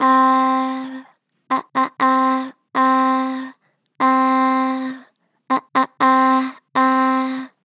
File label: Ah Ah, Vocals, Pitch Flat Gentle; Harmor Vocals